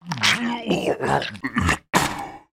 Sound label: horror monster zombie male rip heart out with mouth and spit out; sound effects one